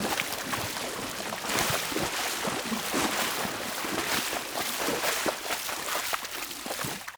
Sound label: animals water fs fast